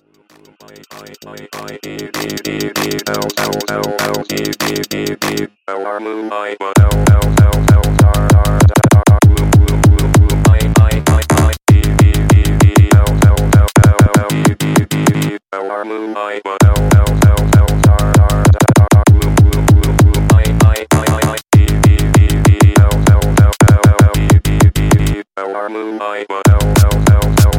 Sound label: fight music